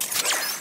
knife draw, karambit; knife m9 bay; weapons; prime karambit

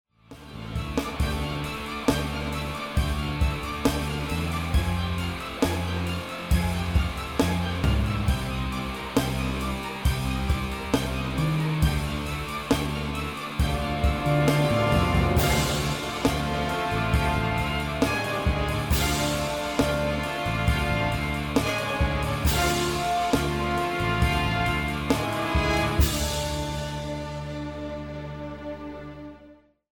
bomb planted; music kits custom

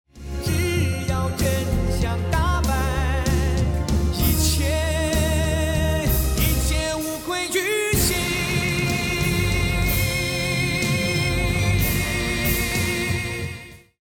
choose team; music kits custom

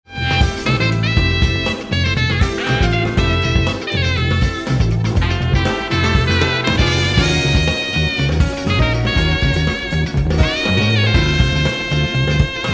won round

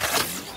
ak47 clip in; prime vandal

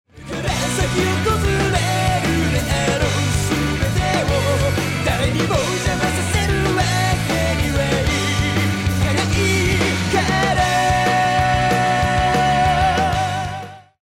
won round; music kits custom

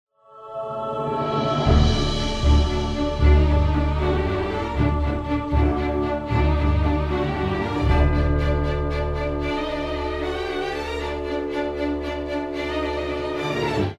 won round; music kits custom

